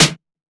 Snare

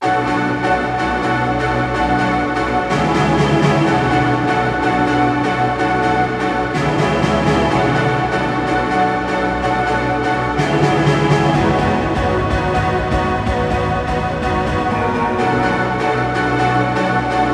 WET MUSIC LOOP, F-M, 125 BPM

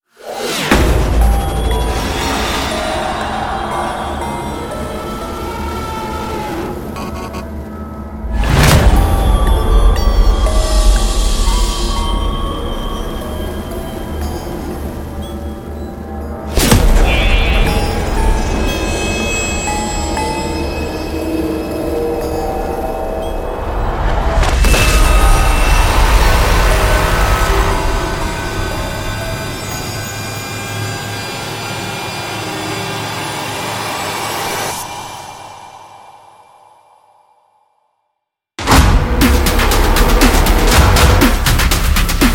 Horror Trailer SFX

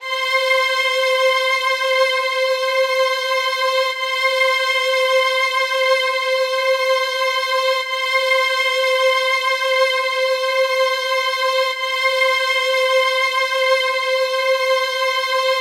Strings 123 C Dry

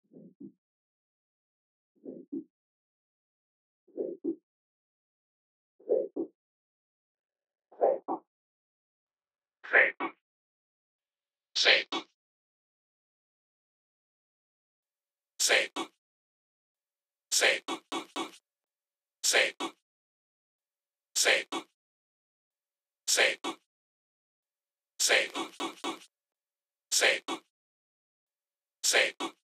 Vocal Dry Bmin 125bpm

